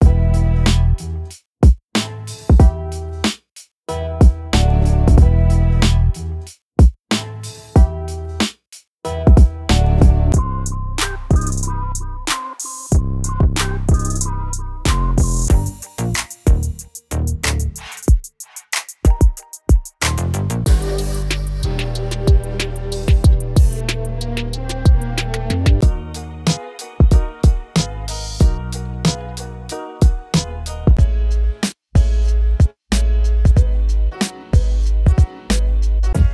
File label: BARS DRUM KIT